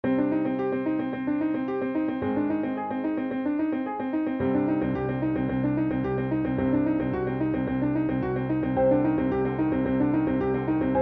Tree Stage; supernintendo; Donkey Kong Country 3

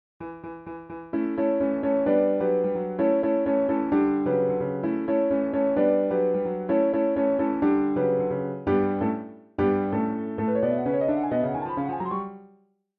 Invincibility; segagenesis; Sonic the Hedgehog

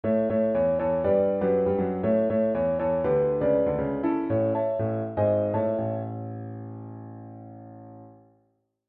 Title Screen; segagenesis; Sonic the Hedgehog

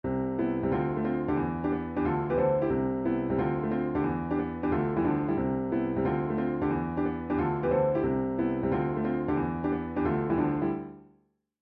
Character Select; supernintendo; Super Mario Kart

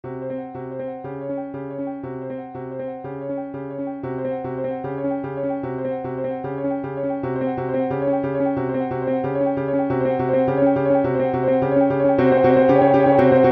Drowning Timer; segagenesis; Sonic the Hedgehog

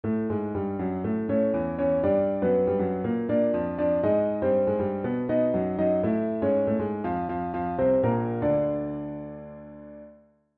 Continue; segagenesis; Sonic the Hedgehog

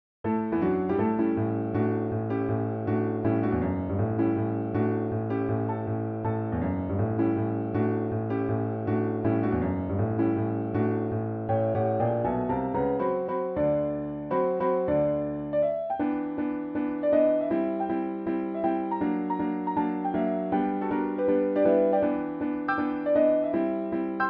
Bonus Stage; supernintendo; Donkey Kong Country 3

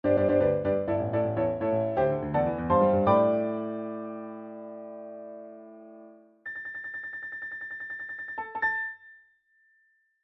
Act Clear; segagenesis; Sonic the Hedgehog 3

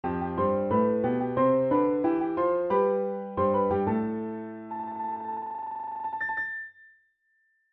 Act Finish; segagenesis; Sonic the Hedgehog

